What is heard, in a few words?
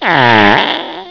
fart; Higher pressure cheek fart sound.